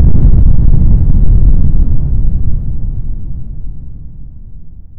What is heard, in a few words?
big explosion